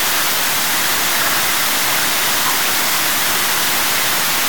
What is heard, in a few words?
rain wind